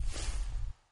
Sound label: spin exit; riviera Star; Fantasma